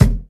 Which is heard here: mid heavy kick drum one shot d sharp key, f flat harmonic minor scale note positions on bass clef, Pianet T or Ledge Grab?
mid heavy kick drum one shot d sharp key